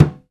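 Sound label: muted hand Timbales; E-MU Producer Series Vol. 2 – More Studio Essentials; Composer; Timbales Xswitch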